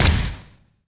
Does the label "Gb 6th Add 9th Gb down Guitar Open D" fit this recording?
No